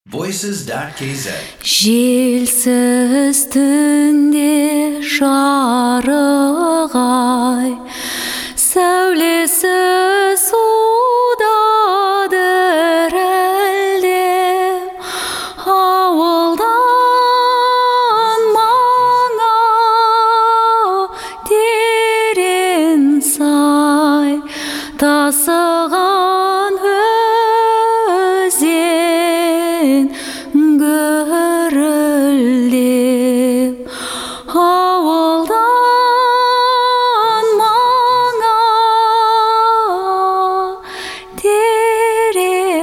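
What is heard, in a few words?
Kazakh vocal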